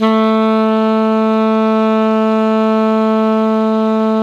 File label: ALTO PP A 2, SAX; Greytsounds - Philip Wolfe David Crozier's Saxophones